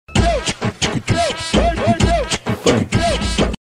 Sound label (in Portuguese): gaveta funk